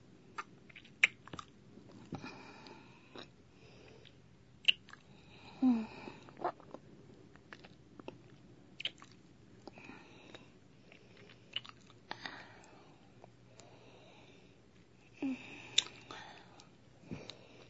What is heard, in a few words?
sucking